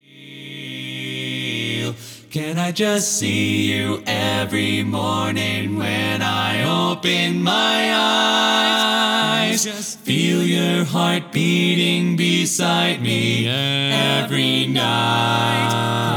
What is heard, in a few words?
Bass